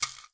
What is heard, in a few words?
shaker; ETHNIC and WORLD PERCUSSION; Bunch of Shakers